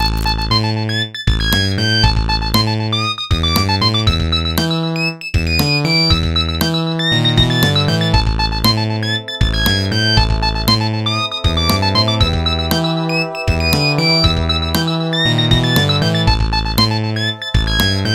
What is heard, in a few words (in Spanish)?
Tono de espionaje Nokia